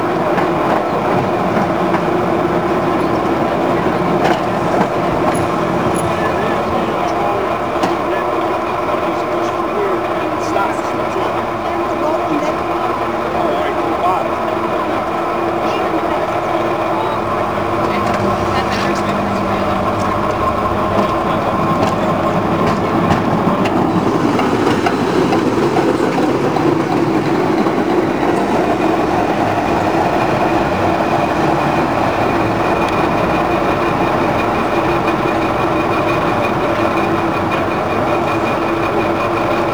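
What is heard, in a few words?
tram, exterior; ENV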